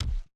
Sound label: reel bounce